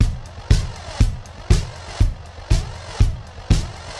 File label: Tubb 120, drums loops; RoBKTA Sample Pack Supreme